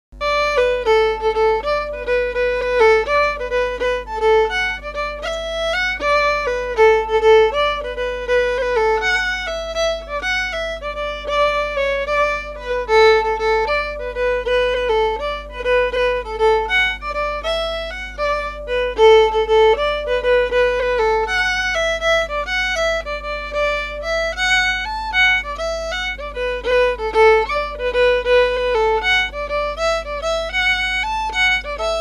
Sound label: jig